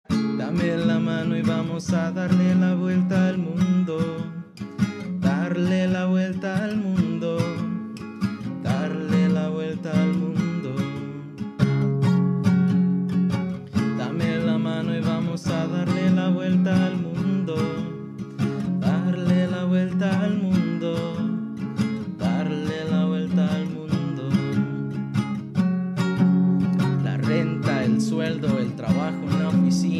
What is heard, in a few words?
singing; Quackity; Spanish